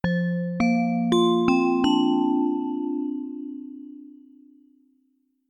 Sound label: BREAK TONE